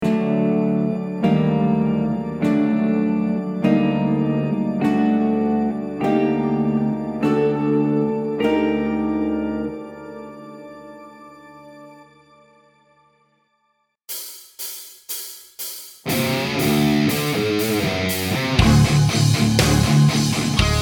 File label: METAL Music